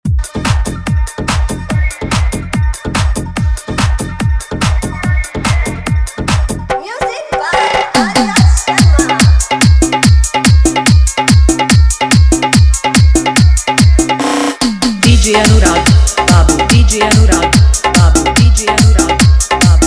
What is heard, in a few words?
Hot Bojpuri Viral Fully Dhollki Bass Dance Mix